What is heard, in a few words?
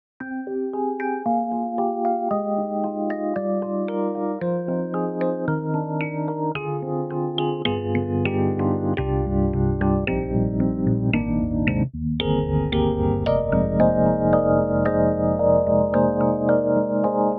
Rhodes; EDIROL Super Quartet; Rhodes Trip